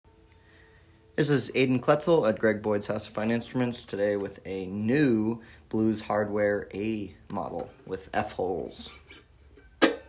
Blues hardware a w f holes

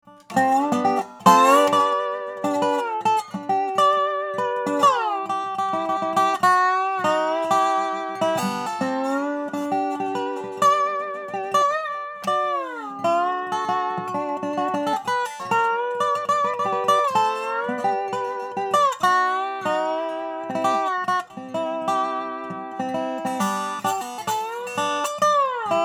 National Model D Square Neck

